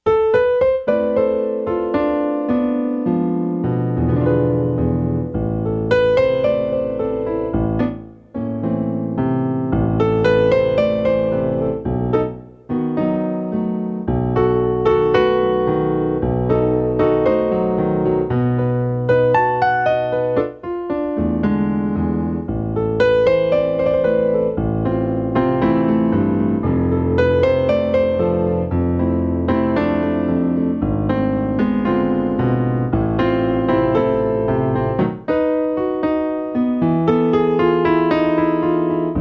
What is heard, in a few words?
Slow Tango